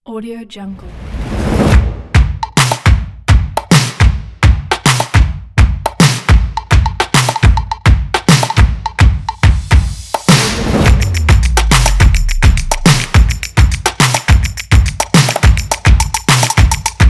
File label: rhythmic percussion